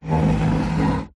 household chair wooden old slide scrape wood floor recorded under seat